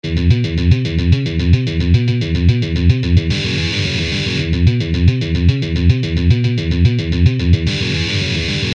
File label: Lick